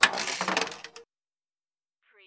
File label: Pinball sound, clank with ball travel